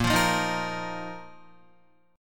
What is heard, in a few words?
Bb Suspended 4th, Bb, down, Guitar; Bbsus4 strummed